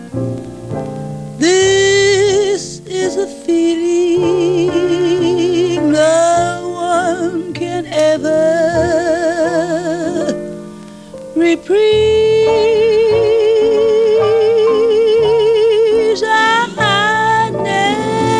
jazz

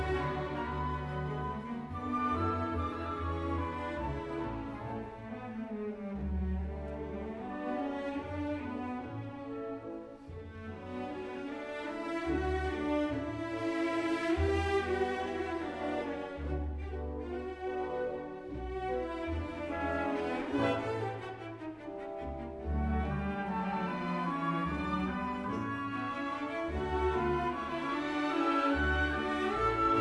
classical

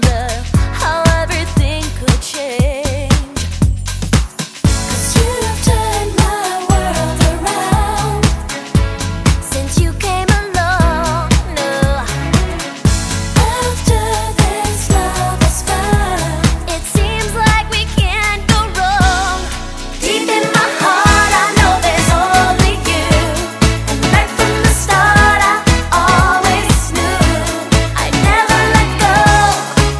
pop

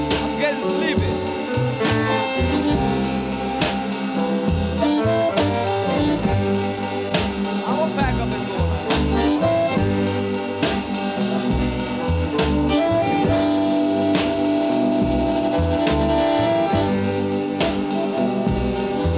blues

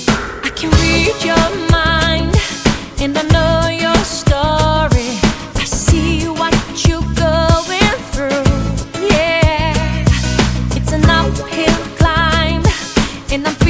pop

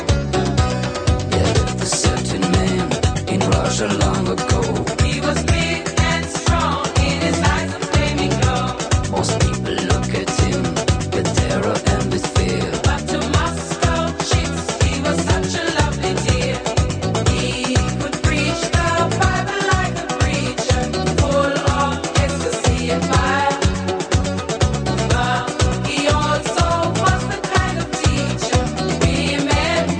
disco

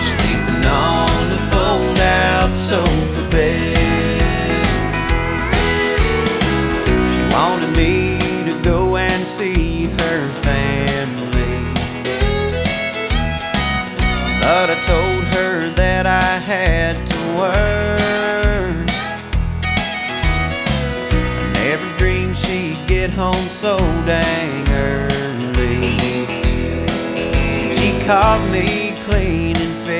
country

